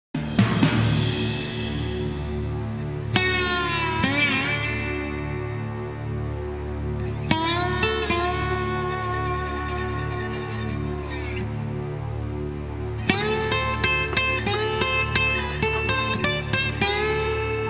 suonerie blues

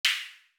suoneria botton; beep

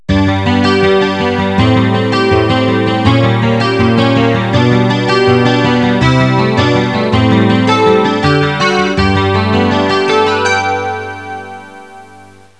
musica newage

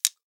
suoneria botton; beep

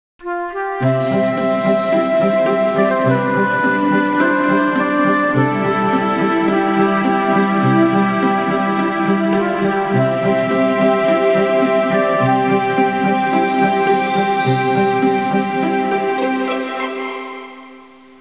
musica newage; Suoneria

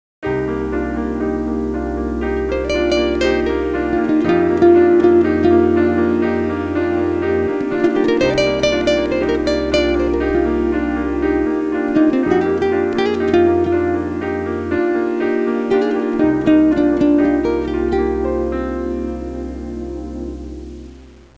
suonerie musica jazz

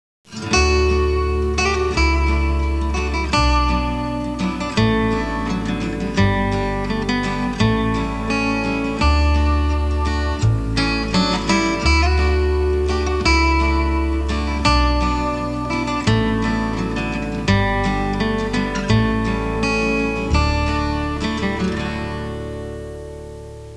suonerie contemporanea; musica contemporary

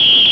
persone fischi

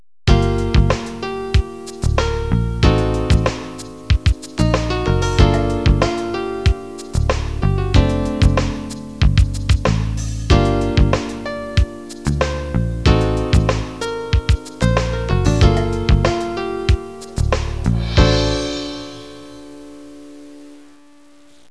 suonerie businessy

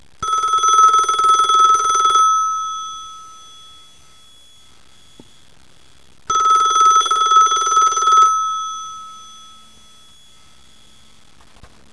musica elettronica; electronic

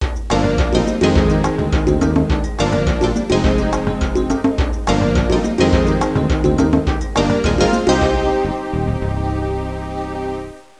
suonerie contemporanea; musica contemporary

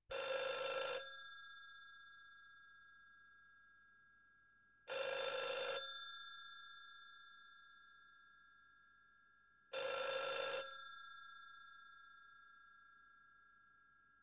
SQUILLO DEL TELEFONO; phone

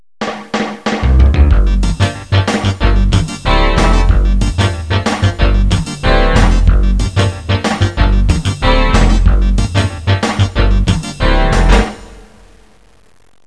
musica newage

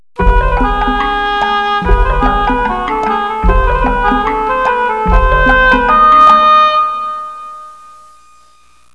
musica newage

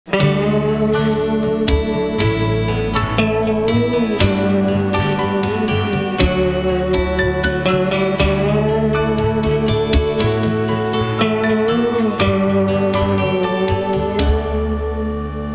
suonerie musica hawaiian

